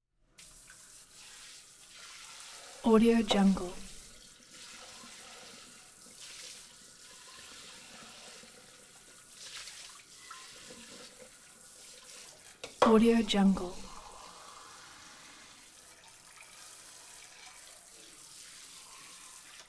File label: house kitchen sprayer on dishes close; collanderson